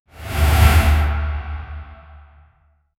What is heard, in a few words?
cinematic swoosh